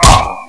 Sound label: punch